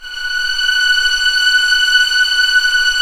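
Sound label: violins, orchestra; String Master Series; Vlns 7 Orch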